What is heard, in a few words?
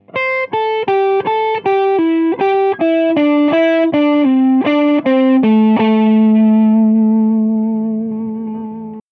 picking